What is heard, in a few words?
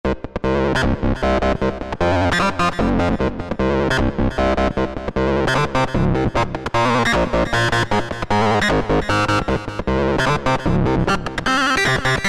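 Sequence Hard Groove; Factory presets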